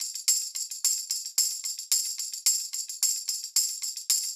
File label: Tamb Proc 110, funk soul Beats; sampled funk soul